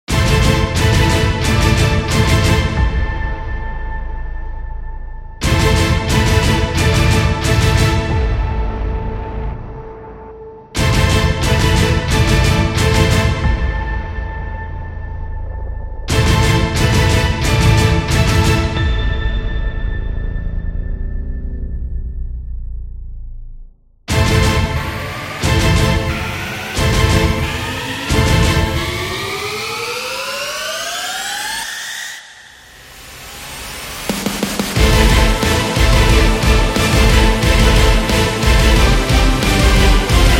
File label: Epic Metal